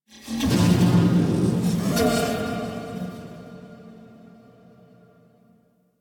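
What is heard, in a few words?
Tech Texture Medium, Ambience; Warframe; Cephalon Weave; DE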